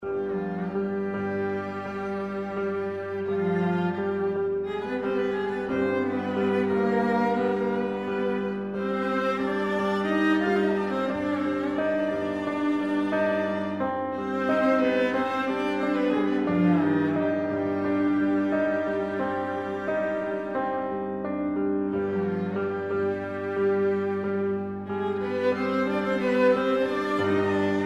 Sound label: Allegro